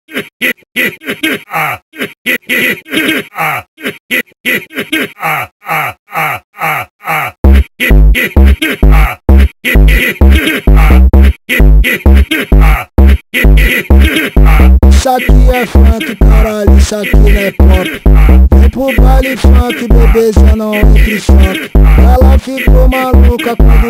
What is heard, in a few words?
Brazilian Funk